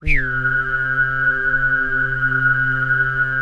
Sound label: WHISTLIZR, HUMAN SYN; ILIO; Vocal Planet VOL-3; Jazz & FX